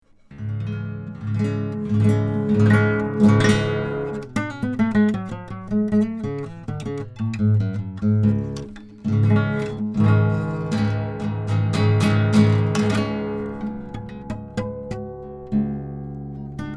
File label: KM 184 Nylonstring; MH ULN 2